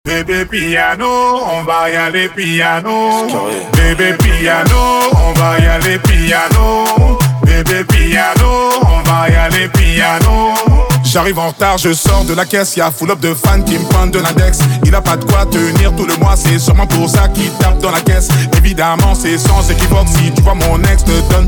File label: PIANO; piano intro